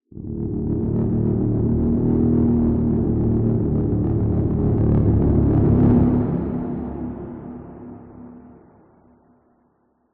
horror accent low waivering growl distortion into swell abrupt finish reverb; ominous; Skyclad Sound